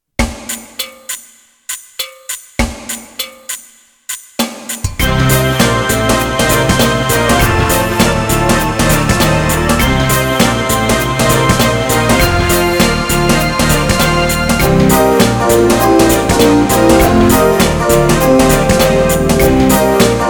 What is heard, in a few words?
Funk Factory